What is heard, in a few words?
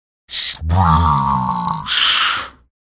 sploosh